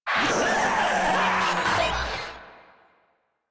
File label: Proto Infested vocal attack; Warframe; InfestedNinetyNine